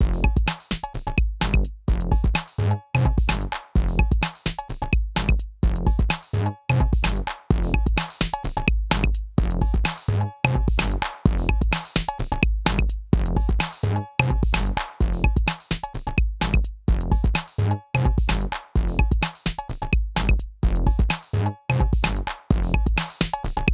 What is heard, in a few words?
att 15, rel 100, Hard, Flat (16dB gr)